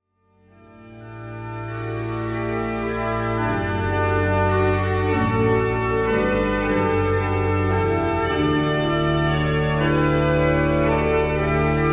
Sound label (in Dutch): Orgel Behringer C2